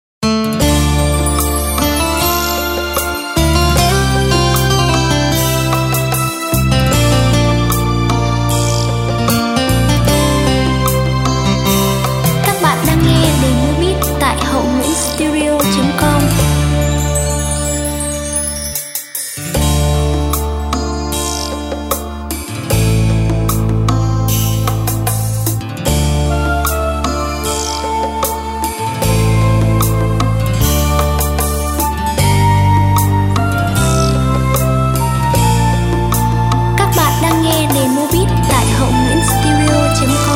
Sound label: beat, playback